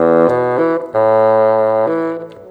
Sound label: Bassoon, Orchestral, Rock Pop